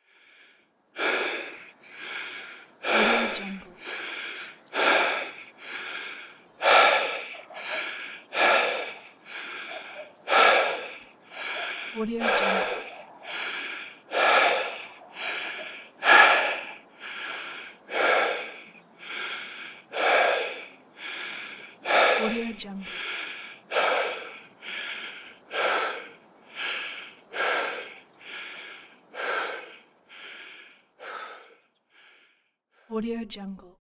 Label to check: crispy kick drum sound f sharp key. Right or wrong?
Wrong